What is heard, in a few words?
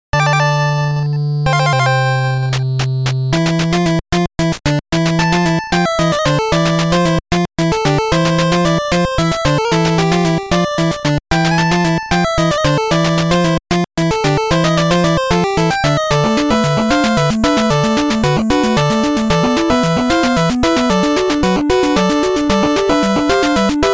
Stage Music; Armadillo; NES